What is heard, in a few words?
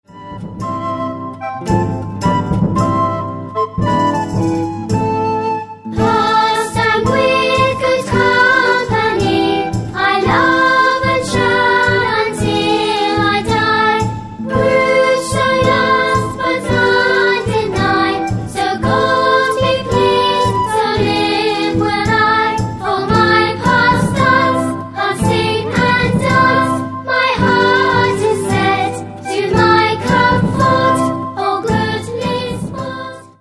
Pastime With Good Company (vocal)